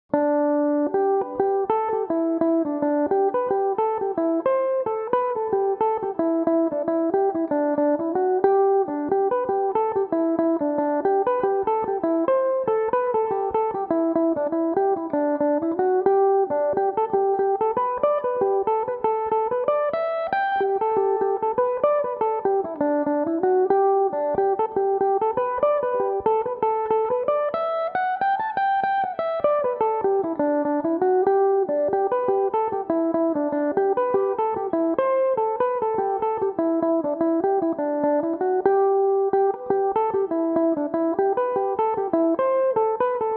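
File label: G major, mandolin; Garry Castle; (9/8), G Major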